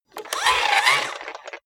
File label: robot movement medium